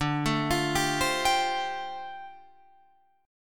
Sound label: D Minor 11th D down Guitar Open F; Dm11 arpeggiated